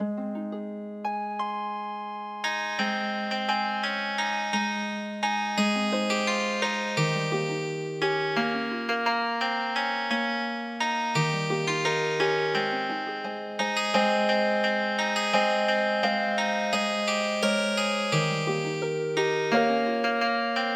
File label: Healing Harp; DIE TIROTERSCHUTZEN